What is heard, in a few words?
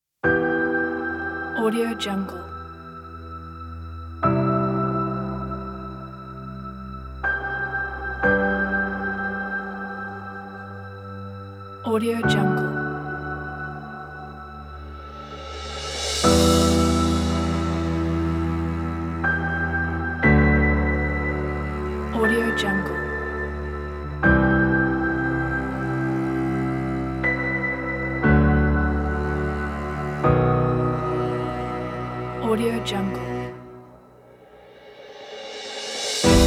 cinematic futuristic intro